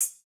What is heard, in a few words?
hihat, house